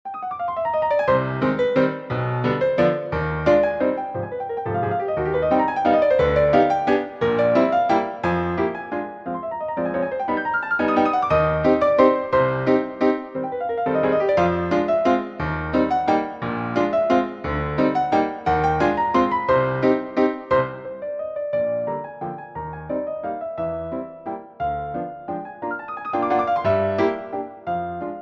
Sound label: Prelude C minor